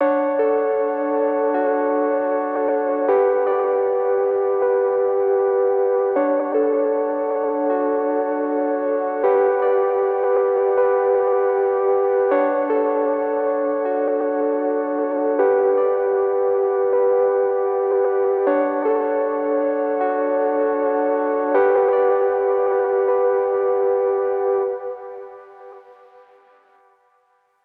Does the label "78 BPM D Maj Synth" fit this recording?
Yes